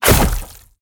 impact; smite; Anhur

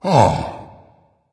sigh dragon; fafnir

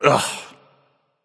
ugh; smite; arthur

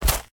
footsteps; smite; god merlin

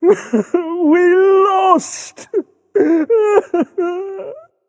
boo hoo; smite; arthur

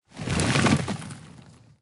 armor; smite; geb

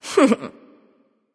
hehheh; smite; pele

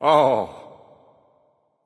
grunt; Smite; bacchus

